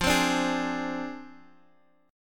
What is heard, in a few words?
D-Minor 7th Flat 5th F, chord